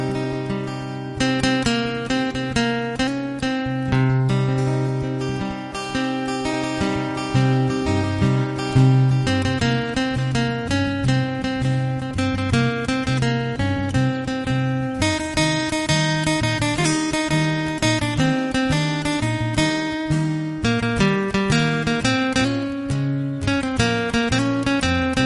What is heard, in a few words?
instrumental guitar bgm